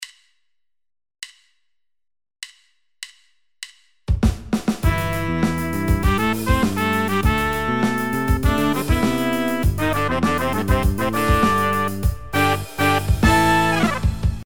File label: Pops Horn Section